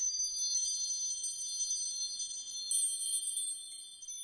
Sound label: gambler mouseover; Hot Party Deluxe; Wazdan